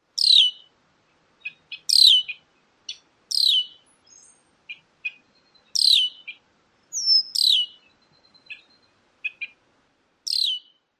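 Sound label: red-winged blackbird other calls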